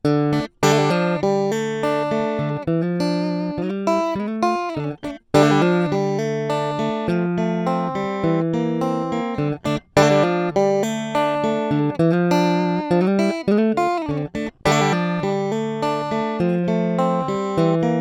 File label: gk extreme gp10 strat